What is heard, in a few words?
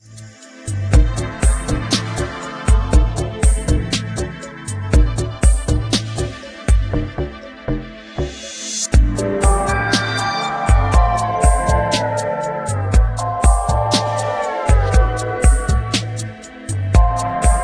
transmission, ambient